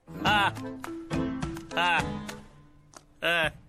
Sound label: sloth laugh; sloth laughing; zootopia